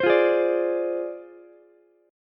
D Minor 7th Flat 5th Ukulele; Dm7b5; strummed

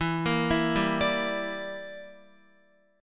E Minor 7th Flat 5th E, Guitar; Em7b5; arpeggiated; x,7,8,9,7,9

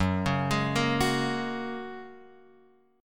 Gb Major Add 9th, Guitar, Open F; arpeggiated; JGuitar

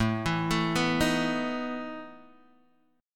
E Major 7th Suspended 4th, A

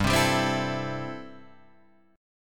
A-Minor 7th G

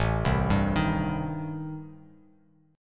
A-Minor 7th Flat 5th, Bass Standard, chord; Am7b5 arpeggiated; JGuitar

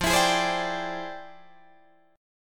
F Minor Major 13th, Guitar, DADGAD

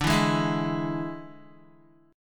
D Suspended 2nd Flat 5th D Guitar; 9,7,8,9,8,x; strummed; 4F,4A,5C,5G,5C,6E

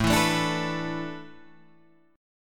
A Suspended 2nd Suspended 4th down Guitar Drop D

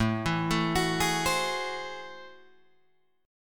A Minor Major 13th, down, Guitar, DADGAD; AmM13; arpeggiated

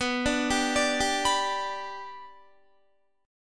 B Minor Sharp 5th, Guitar; arpeggiated; JGuitar

